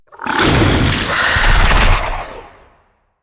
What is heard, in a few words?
archeology event dangerous animals; ancient relics